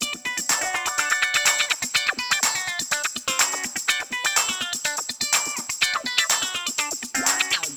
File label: Tennis - Normal Court (Intro Loop); Super Monkey Ball 2